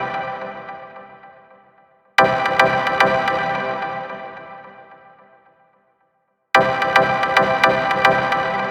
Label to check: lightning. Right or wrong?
Wrong